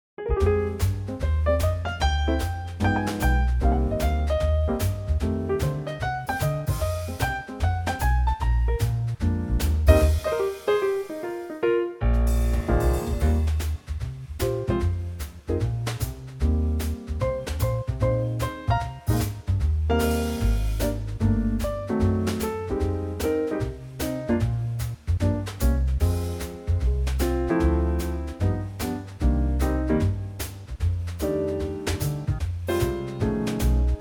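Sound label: Eb swing, backing track; key - Eb - vocal range - Eb to Ab; Gorgeous Trio arrangement in a mid tempo swing, perfect for a cool vocal!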